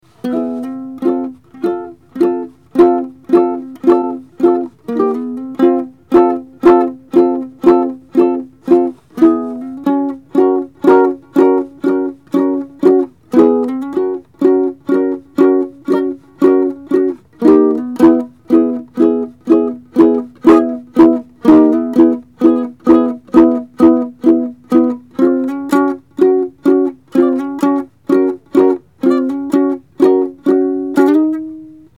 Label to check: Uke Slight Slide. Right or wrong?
Right